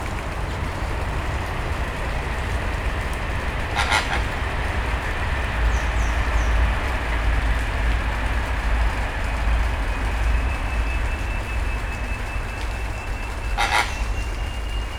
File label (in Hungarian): elhaladó vonat, rózsás gödény; nyiregyhazi zoo 2019